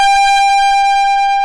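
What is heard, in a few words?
distortion guitar G4, GUITAR; AKAI S-Series CD-ROM Sound Library VOL-8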